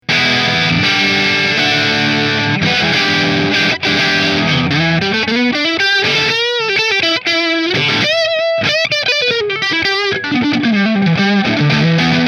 Hamer Custom Neck Marshall, guitars

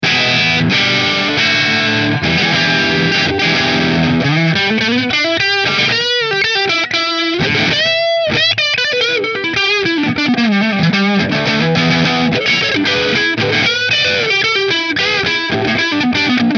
Gibson Goldtop Neck Marshall

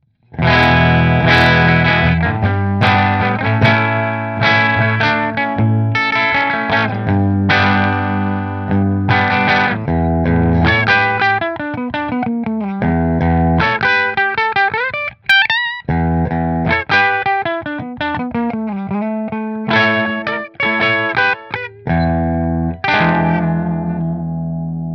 McNaught Grey Bridge Fender

